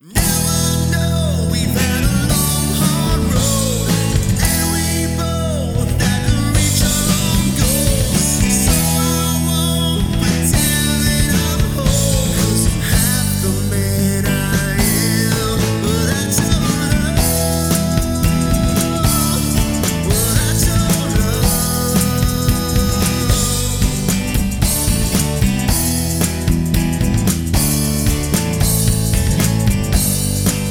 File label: harmony karaoke; music smorgasbord